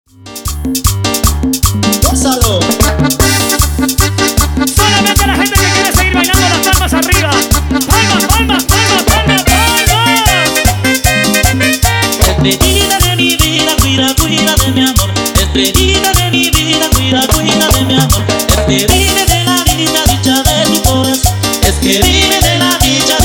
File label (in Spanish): Instrumental Ec Bpm; Dimax Dj Mix; Bpm 153